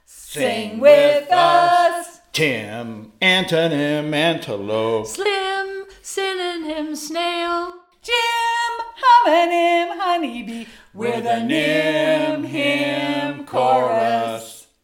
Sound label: Chorus